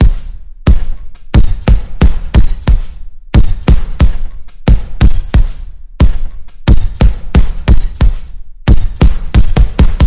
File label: Punch Power Workout Pulse